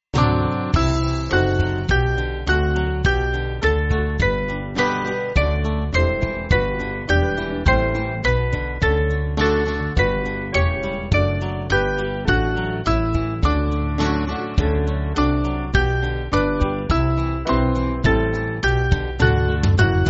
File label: Band, Free Music Backing Files for Churches; Father Of Everlasting; Ascendit Deus